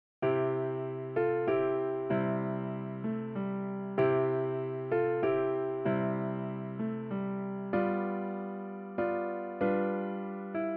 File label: Silent Night, Piano; This sweet Christmas Carol originated in the German language